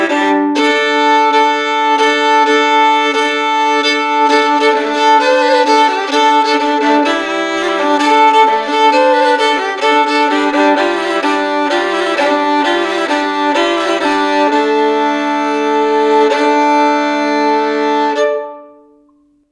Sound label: hardanger fiddle